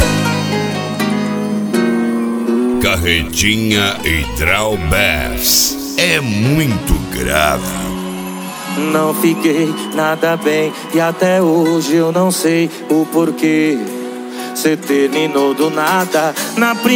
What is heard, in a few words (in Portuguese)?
piseiro